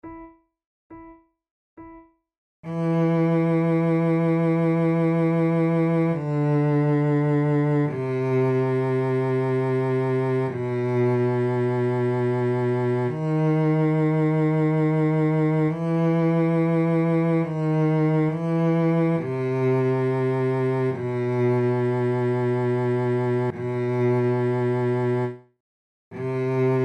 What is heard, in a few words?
recorder sonata in g major adagio; Marcello